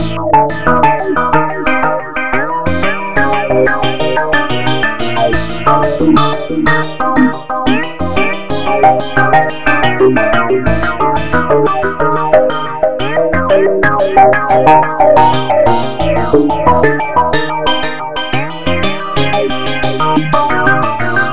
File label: acid wall 90